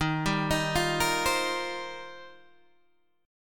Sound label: D sharp 6th Add 9th, Guitar, DADGAD